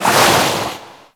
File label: Shark Tail Move Fast; Warframe; Grineer Ocean Shark